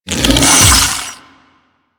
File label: Infested Big Ashen Melee Swing; Warframe